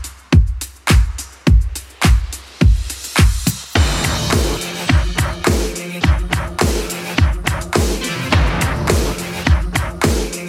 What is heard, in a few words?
80s club 105 bpm clean